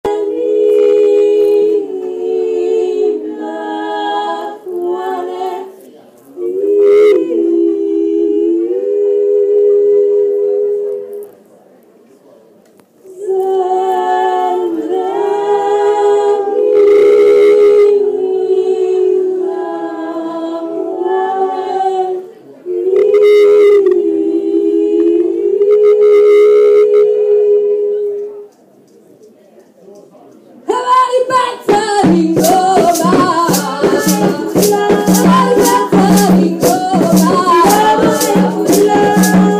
South African singing informal random aftergig chilled